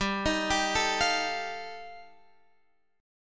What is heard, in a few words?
G 7th Suspended 2nd Sharp 5th G, Guitar Half Step Down